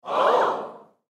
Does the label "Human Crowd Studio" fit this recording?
Yes